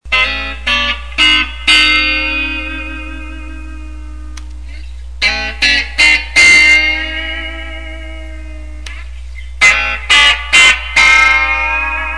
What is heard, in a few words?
neck middle bridge